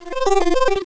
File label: glasses; opensurge